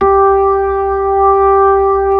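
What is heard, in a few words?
B-3 Club crossfade, Keys of the 60s and 70s; Roland LCDP10 Keys of the 60s and 70s 2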